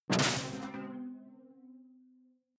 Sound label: sci-fi alien bounce detuned bright; swooshes-swishes